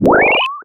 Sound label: retro powerup collect; Retro Classic